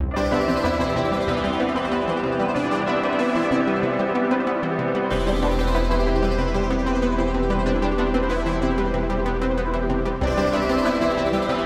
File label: A 94 Dry; MELO + FILTER; RoBKTA Sample Pack Supreme